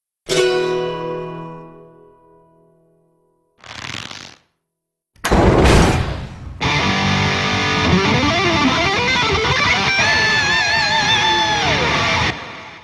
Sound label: transform